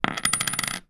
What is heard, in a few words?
foley money australian coin x1 down on hard surface